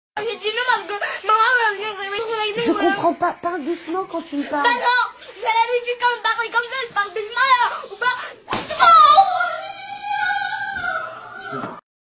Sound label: slap hurt